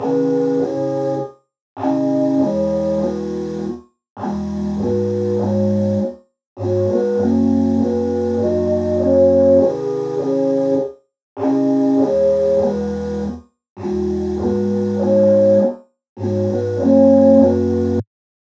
cute loop pop chords sad Bmaj, 100

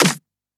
CLAP & SNARE

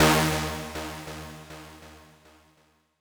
Wide Stab D 2

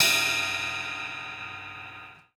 Fireworks Ride

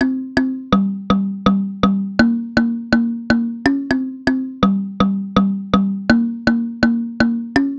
Synth 123bpm Cm Kalimba

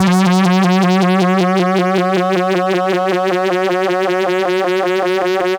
FM Reece Synth, F

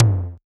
Tom

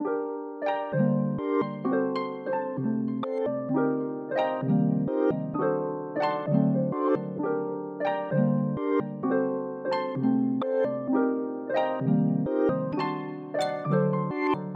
Dreams Harp 130bpm

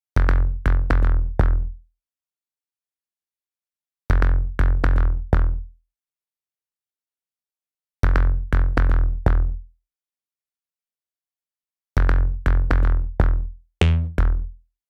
Bass Loop Em 122

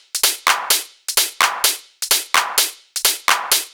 Drum Loop; Evolution Of Sound

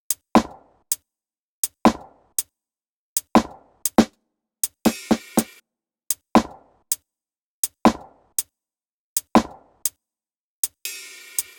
80BPM Ivory Top Loop

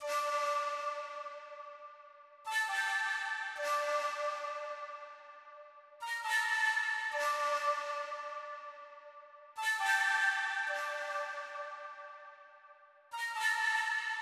LEAD

